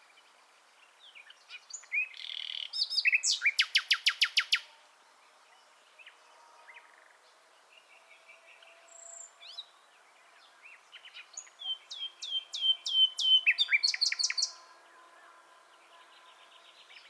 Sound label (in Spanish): Ruiseñor común Luscinia megarhynchos